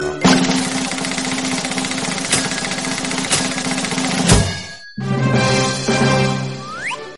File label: thrilling cartoonic background music sms tone; galaxy sms ringtones